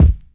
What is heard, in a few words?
Boom-Bap Kick; Kicks